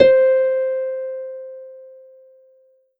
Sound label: Ensoniq ZR-76 Harp C5